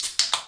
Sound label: soda up